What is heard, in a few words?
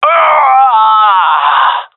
death; Counter Strike; CS:GO; seal diver